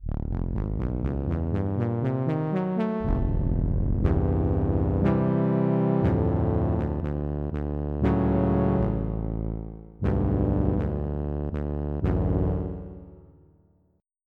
Wet Brass

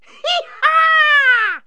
Cartoon Yeehaw!, Yells

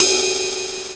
ride; Star Trek JR Audio

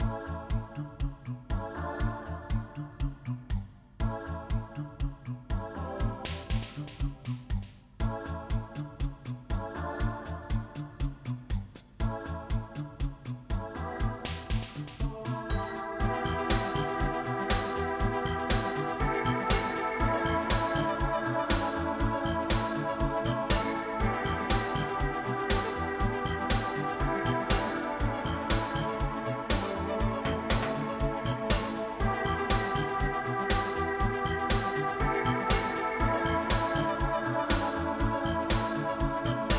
synthy

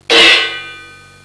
CHOKE LO, PERC

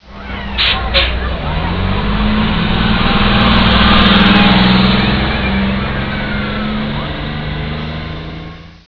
School Bus; T.J. Finds a Friend

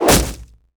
arrow

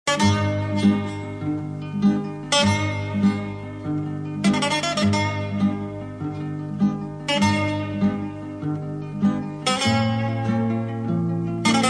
game track; penguin dinner

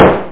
knock; 17 Bit Software 2: Collection B

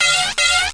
damage; lander

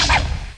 shot; AGGRESS; 501 Great Games - Volume One; Guildhall Leisure Services

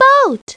boat; Treasure Galaxy

